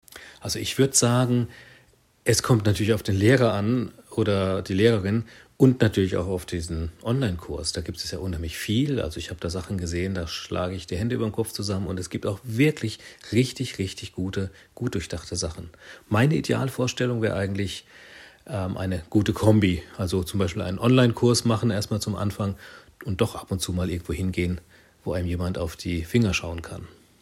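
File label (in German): klavier antwort, piano answer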